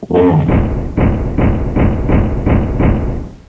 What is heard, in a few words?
FIRE; DINOSAUR